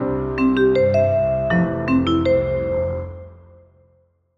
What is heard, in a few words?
jingle study start